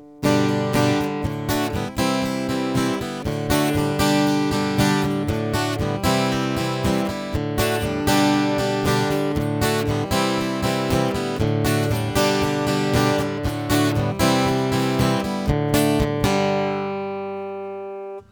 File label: electro strumming